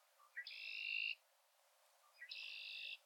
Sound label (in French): typique, carouge à épaulettes